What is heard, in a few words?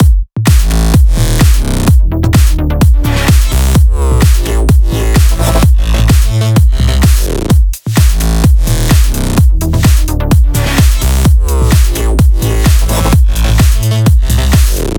128BPM Mix; Vengeance Samples; Economic Kit